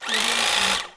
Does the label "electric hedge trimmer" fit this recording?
Yes